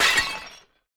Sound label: Break Vase; Boomerang Fu